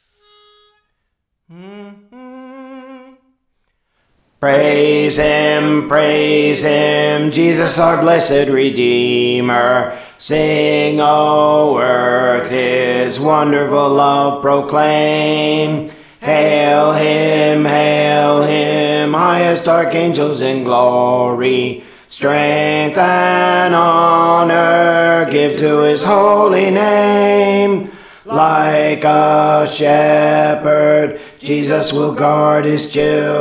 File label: Alto, Praise For The Lord Training; Praise Him Praise Him